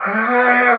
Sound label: vocal unwind Bm; SD AURORA Sample Pack; Slate